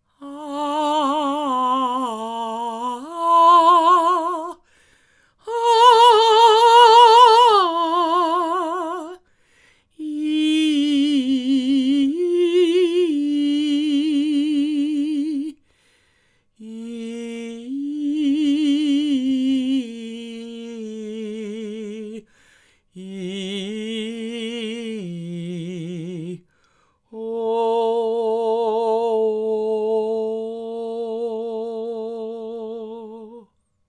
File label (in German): Ohne Reflexion Filter